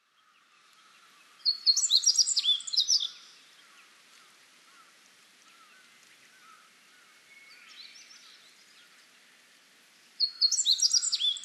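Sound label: hojiro, Meadow Bunting; ichikai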